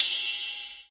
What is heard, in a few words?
CHH, Dance Drums